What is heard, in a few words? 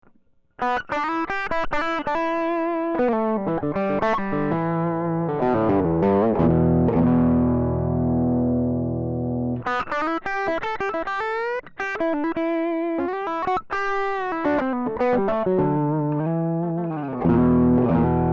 Boogie, Gibson ES345; Doc Scary SCARY DRIVE pedal going into the DI; electric